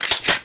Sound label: shotgun reload; gusanos promode